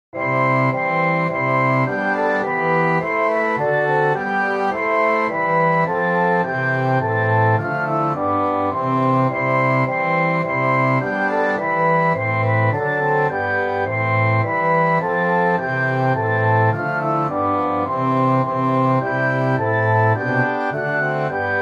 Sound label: Orchestra; Hymns